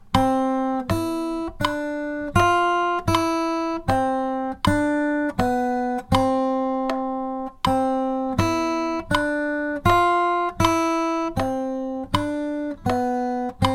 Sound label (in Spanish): melodia en compás de 2x4